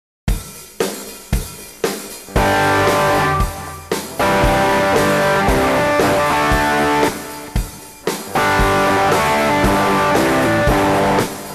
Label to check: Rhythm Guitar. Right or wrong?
Right